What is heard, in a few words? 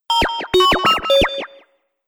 Droid Communicating Bleeps, robot sound effects; Sci-Fi Robot Sfx